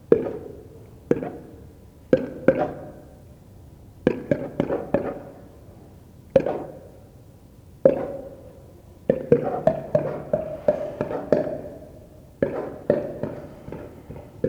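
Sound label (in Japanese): nihon tsukinowa guma, ニホンツキノワグマ 鳴き声; 多摩動物公園; 胸に月の輪のかたちの白斑があります。; ふつうは単独で生活しています。5〜7月の交尾期になるとオスはメスを求めてさかんに動きまわります。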